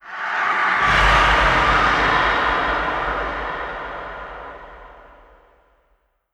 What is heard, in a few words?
cave, antlion distant scream; creatures